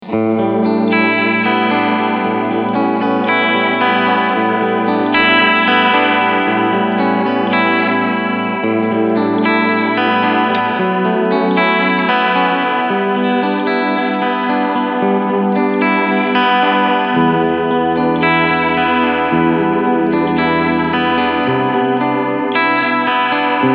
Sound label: Vibrato